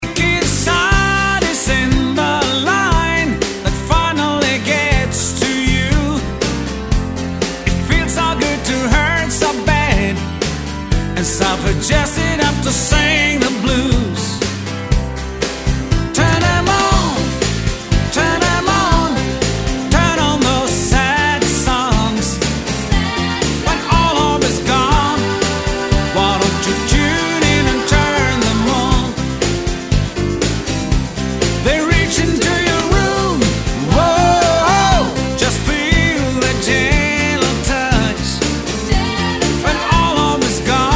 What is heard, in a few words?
Sad songs mix